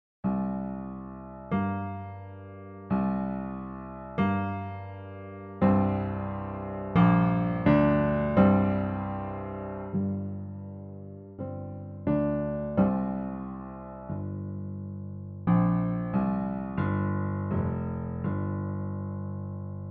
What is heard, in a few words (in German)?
Klassik Intro; Light2Art